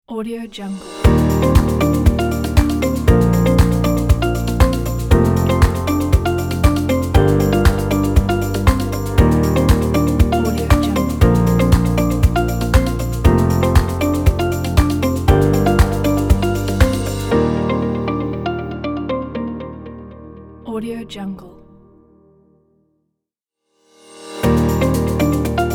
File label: harmonic corporate logo